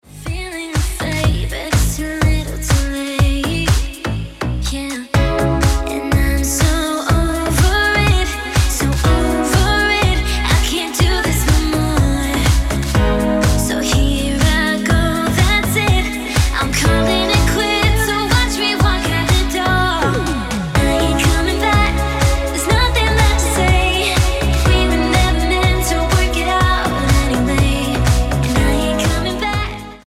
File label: Ringtone